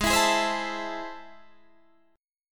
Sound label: A Minor Major 7th, G sharp